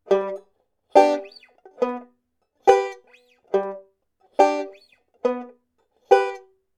vamping auf g mit dur i und dur ii griff

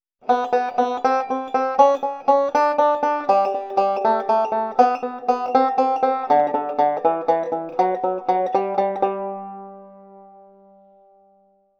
single string triolen saitenklettern

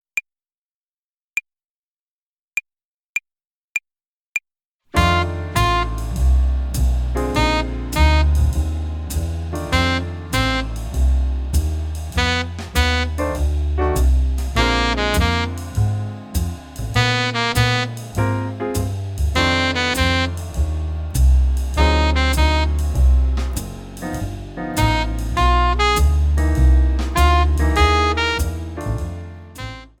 blues tonleiter uebung play along slow

